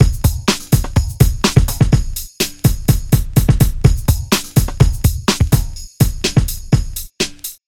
barabannaya melodiya hip hop

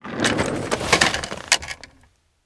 shumnoe rezkoe zakritie yashika stola

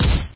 gluhoj udar po grushe v bokserskih perchatkah

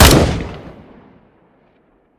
eho donosyasheesya psle vistrela vdal

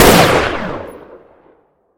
svist pronosyashejsya na skorosti puli

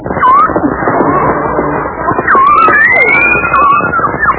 krik kasatki v more

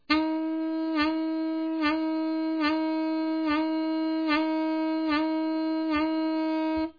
saksofon odinochnij

